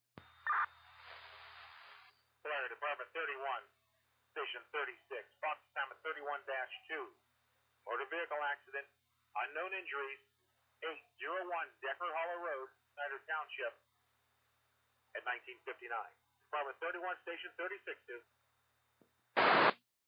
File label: bald eagle page received